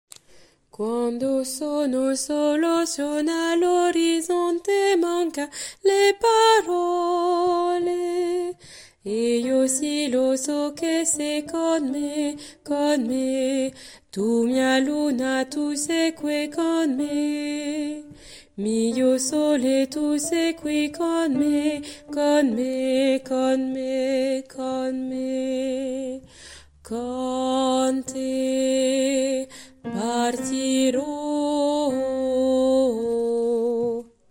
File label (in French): Con te, basse couplet